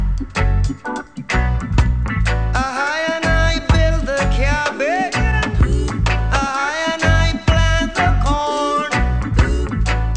reggae

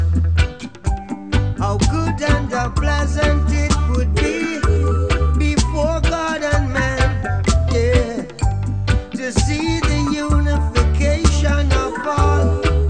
reggae

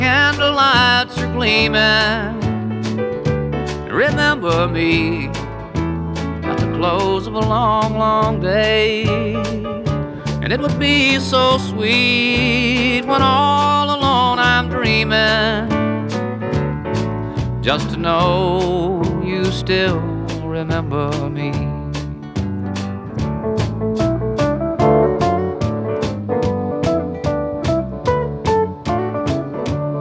country

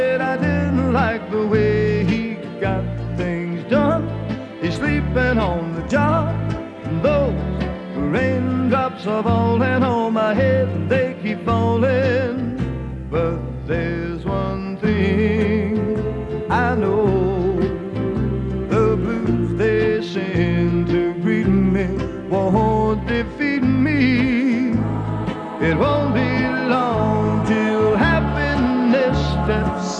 country

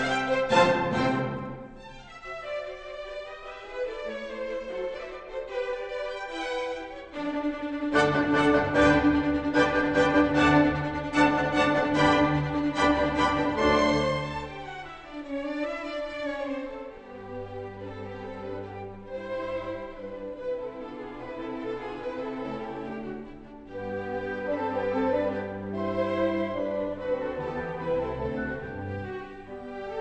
classical

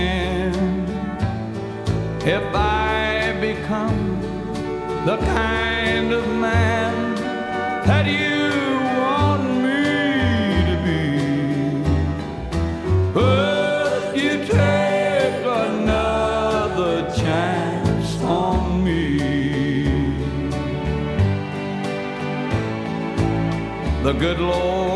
country

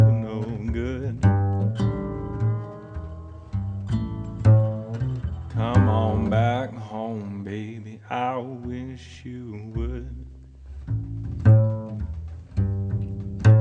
blues

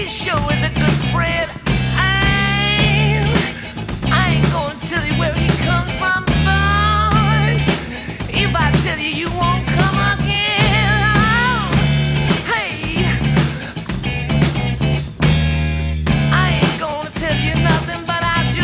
rock

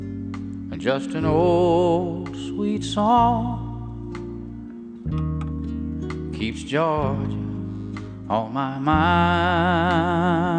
country

